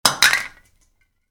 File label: Spray can drop, Spray Can: Drop Onto Concrete; Spraying; Spraying Sound Effects; Spraying (Sound Effects:Objects); Stuart Duffield